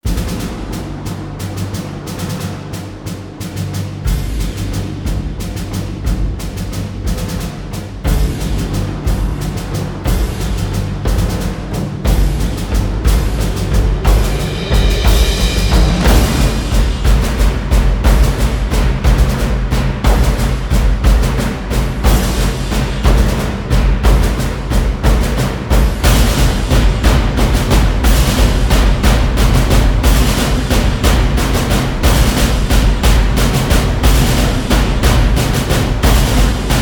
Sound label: Drums Only Version